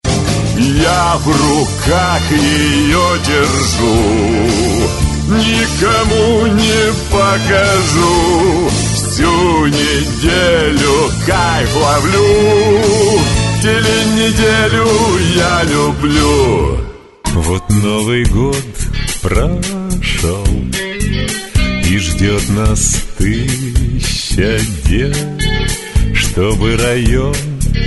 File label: VOKAL